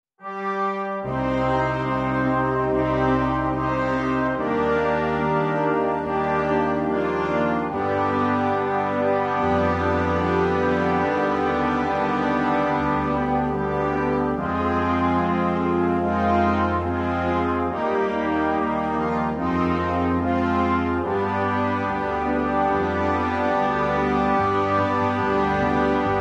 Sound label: Paysage Melancolique, Fanfare